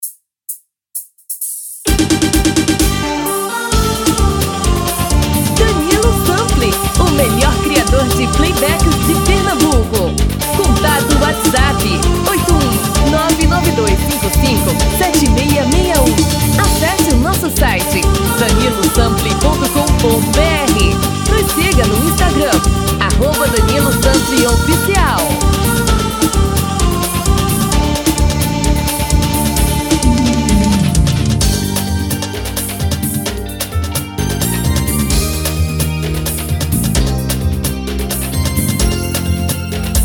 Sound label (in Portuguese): Axe